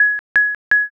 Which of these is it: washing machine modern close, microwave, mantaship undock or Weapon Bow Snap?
microwave